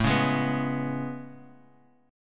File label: A Major 9th A, Bass Standard; AM9; strummed; JGuitar